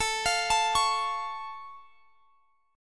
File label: A Augmented A